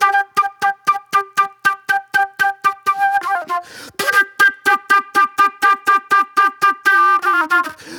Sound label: Super Soul Flute